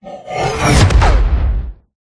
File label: whoosh; Audio Mangler